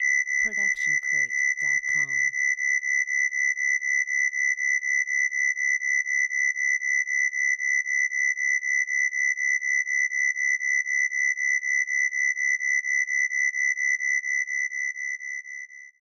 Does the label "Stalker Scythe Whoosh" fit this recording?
No